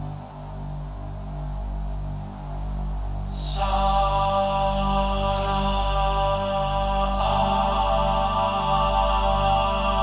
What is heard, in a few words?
Beach Music